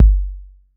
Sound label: BD 808; Wizoo - Platinum 24 Electronic; CHR BD 808